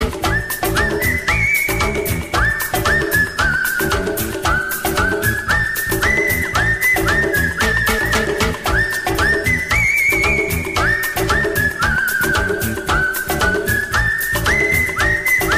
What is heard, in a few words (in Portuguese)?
Assobio Mix